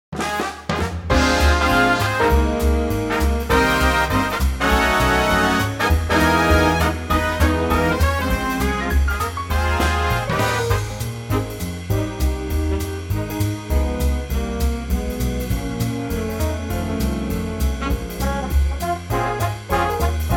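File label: Ab Big Band, backing track; key - Ab - vocal range - Eb to Db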